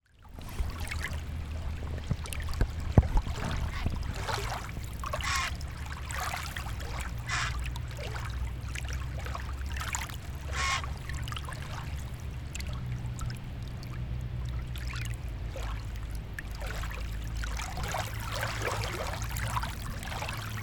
namioto suwako sizuka; 波音（諏訪湖・静）

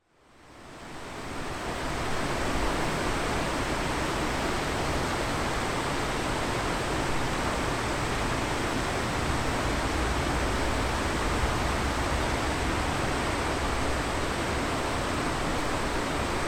kawakami; Chino Cultural Complex

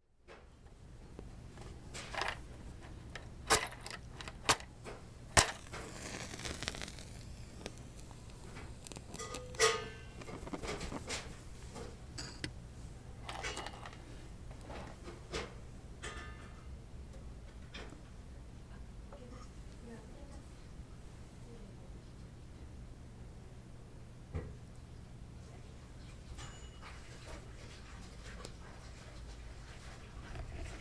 asa no otutome; 朝のおつとめ; Chino Cultural Complex; 茅野市民館

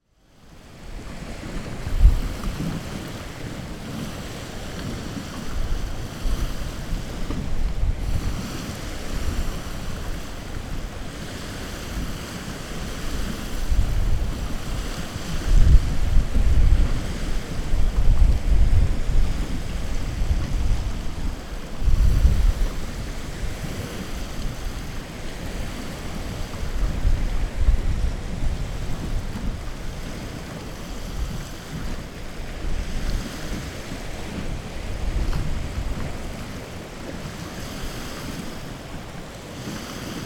namioto suwako areru; 波音（諏訪湖・荒）

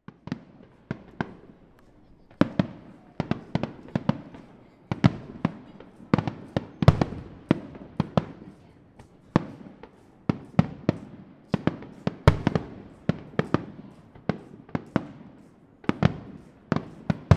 hanabi; chino no hanabi; Chino Cultural Complex

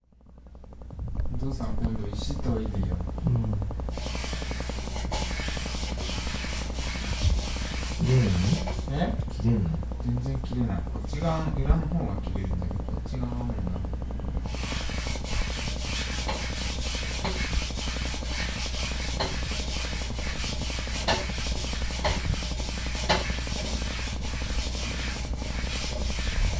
石油ストーブの音; 小池真紀

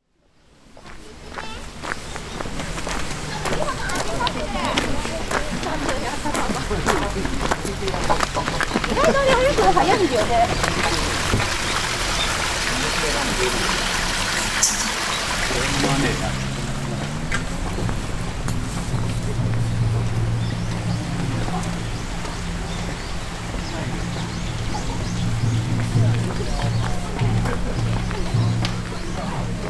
sandou wo kudarinagara; 参道を下りながら（諏訪大社下社）; suwataisha simosha

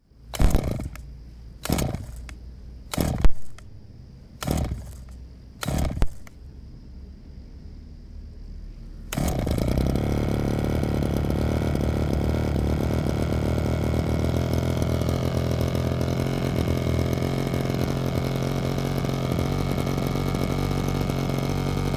kusakari kienjin no; Chino Cultural Complex

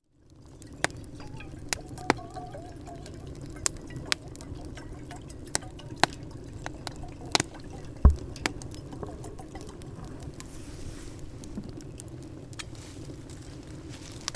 takibi de yuwakasi; たき火で湯沸かし

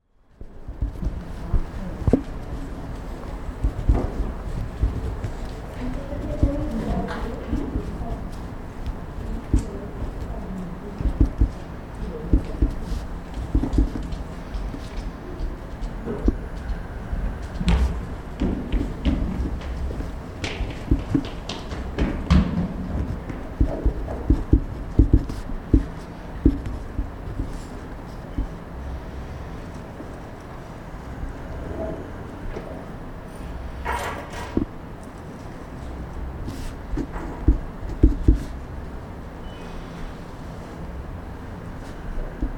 数学を解く筆音（茅野市民館の図書室）

